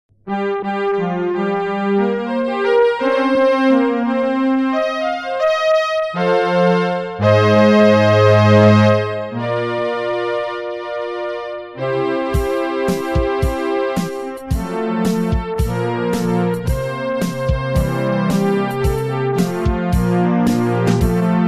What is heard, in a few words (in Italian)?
solo musica